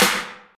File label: old school present acoustic snare b key; snare drum samples